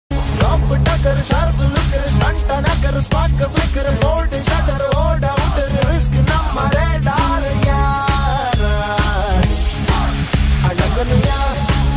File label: Tamil BGM Ringtone; Sarkar